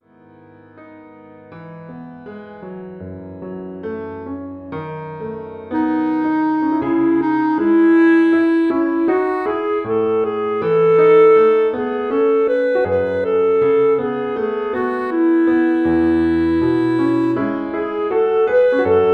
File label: clarinet in Bb solo